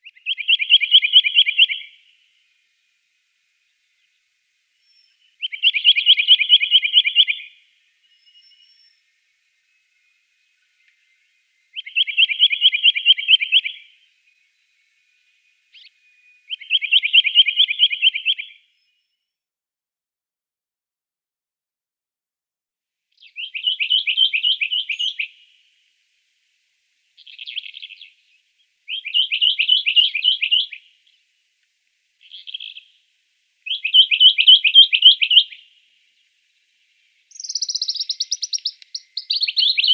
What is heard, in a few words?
Rock Nuthatch song